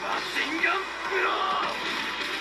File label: Machine Gun Blow